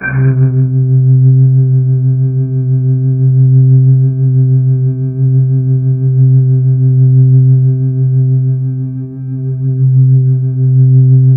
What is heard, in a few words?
C2, Synth Line; 90 s Sample CDs; NiceBeats; SynthLine VOL-32